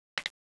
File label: click